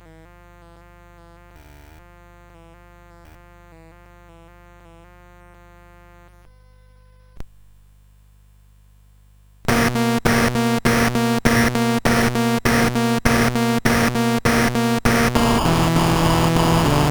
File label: Drums and Stuff, CSG8580R5